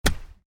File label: Volleyball block medium, Volleyball (Exterior): Block Medium; Sports; Miscellaneous Sports